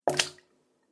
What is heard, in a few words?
Water Hit And Splash; Splash Sound Effects; Agcnf Media